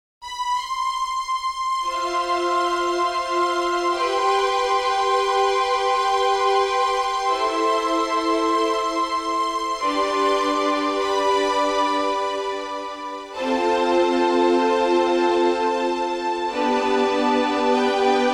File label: Strings; YDP141